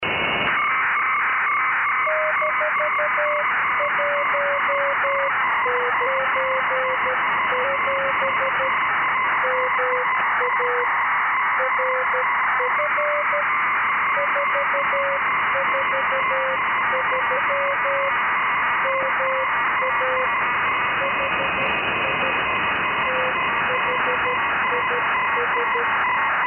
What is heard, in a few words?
ARISSat-1 CW BPSK; JN1GKZ